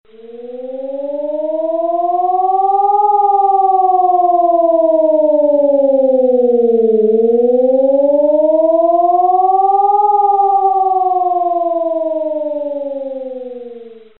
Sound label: the 2 minute fluctuating wavy warning tone